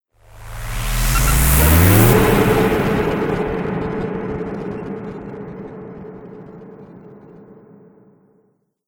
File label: Trailer Hit Glitch; Trailer Sound Effects